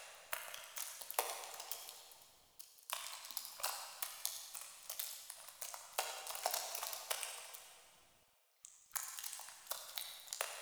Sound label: Infested Eris Interior Critter Loop